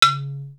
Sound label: marimba c#3